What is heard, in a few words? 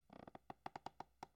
floor creak; angband